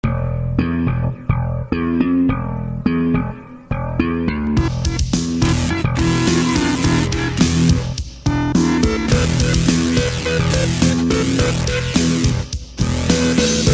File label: Technofunk